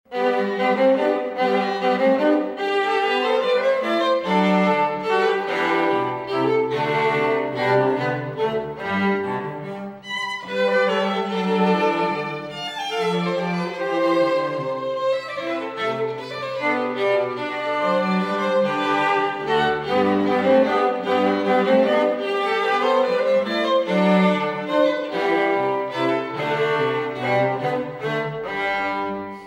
Classical String Trio Minuet; Mozart

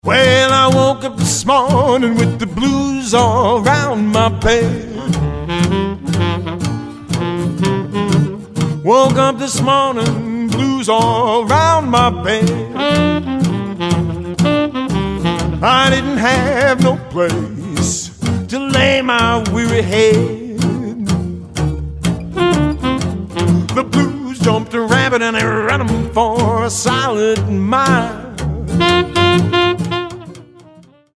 Early Morning Blues, Dixieland jazz band; 20s band

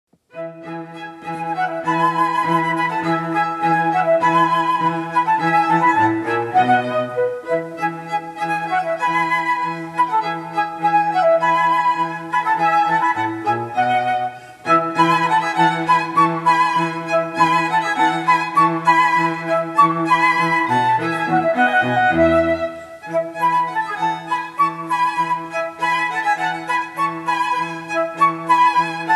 Flute And String Trio

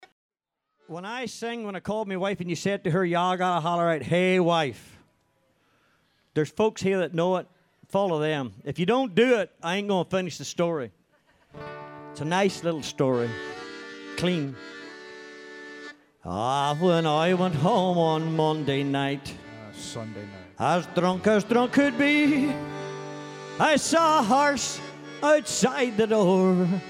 irish band; Seven Drunken Nights

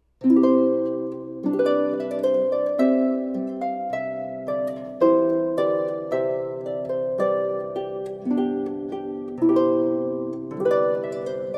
Harp

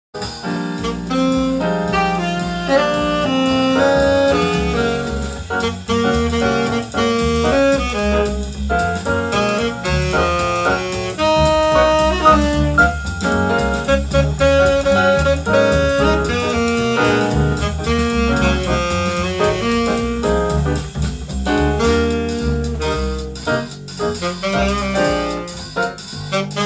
Jazz Quartet; jazz band

